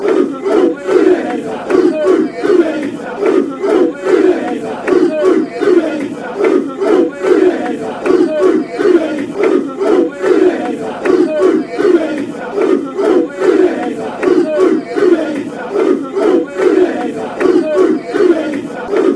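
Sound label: ooh ooh, football fan chants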